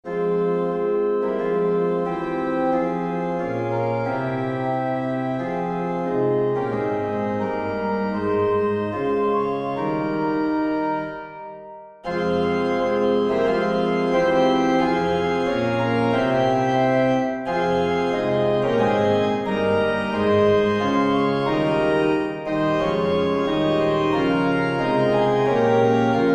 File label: Organ